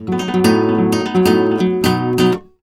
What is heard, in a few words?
guitar B, Flamenco Guitar Arpeggio; Best Service ProSamples vol.46 - Flamenco